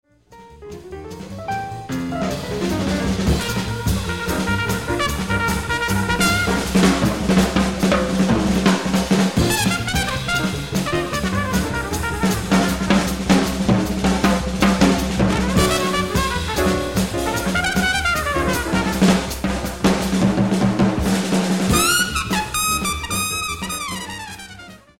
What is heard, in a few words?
drum; drum transcription